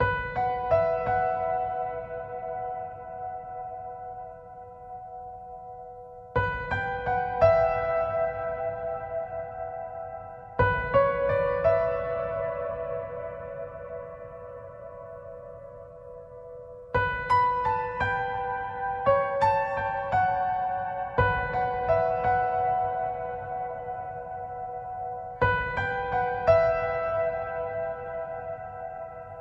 Piano Only

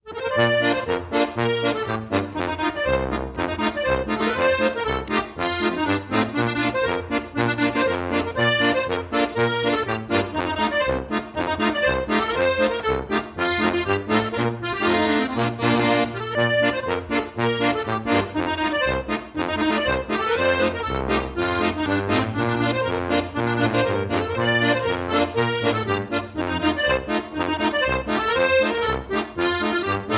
Polka, No Clarinet

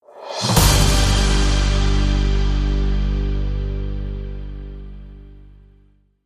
News Alert Sting

